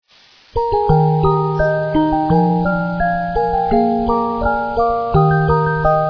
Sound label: magic flute; Reuge